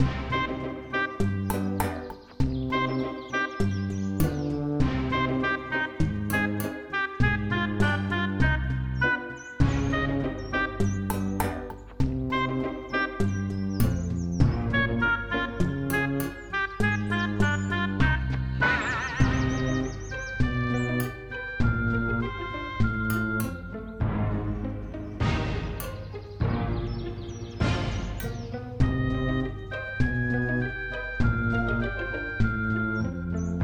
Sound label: 100bpm W Ambience; Plaza 64beats